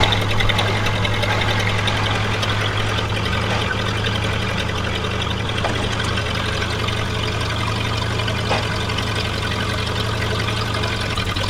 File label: mecha idle